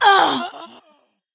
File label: Wound Crit; Keristrasza